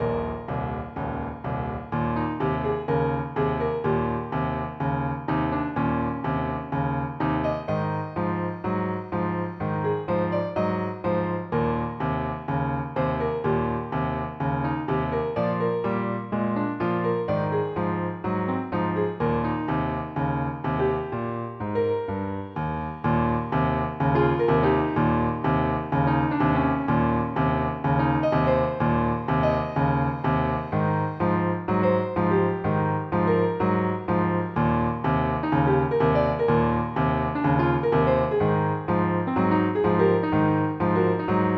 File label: BLUES; BigMax 1; MIDI Music File